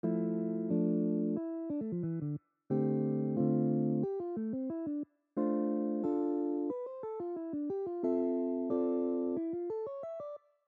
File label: major 251